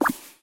rollover book small; activity center; backyard; preschool time

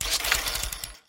rollover plane mobile; activity center; backyard; preschool time

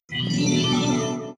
unlock discover; john carter; lost symbol

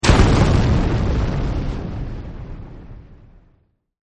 explosion

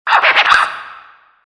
hiphop; camp rock back to school

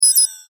infobox out; heros duty

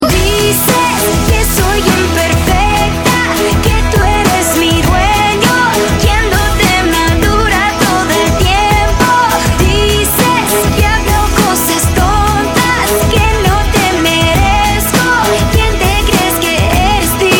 Spanish Version; disney

